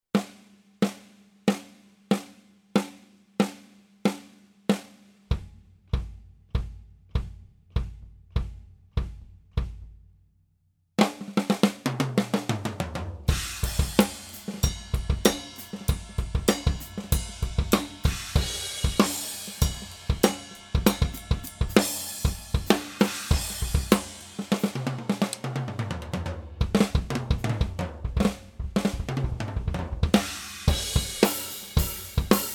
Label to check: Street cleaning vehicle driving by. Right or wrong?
Wrong